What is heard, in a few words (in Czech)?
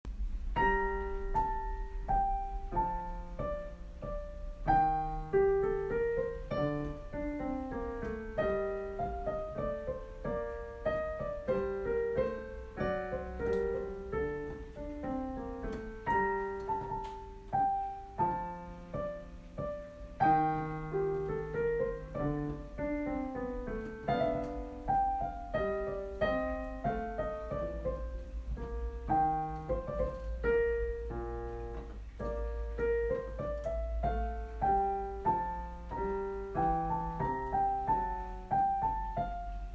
Menuet g moll klavir